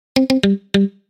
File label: Muted Guitar; Coolpad 1827; notifications